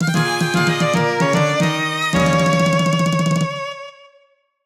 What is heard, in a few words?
death; donkey kong country 2 fan remastered